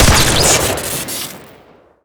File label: shoot crit, weapons; tf2; winger